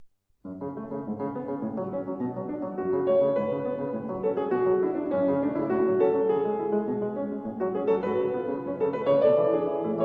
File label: Passepied piano; Debussy